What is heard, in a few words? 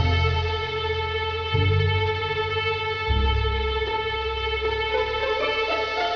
dramatic lo